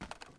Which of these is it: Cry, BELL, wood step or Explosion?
wood step